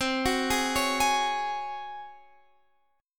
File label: Db Major 7th Suspended 4th C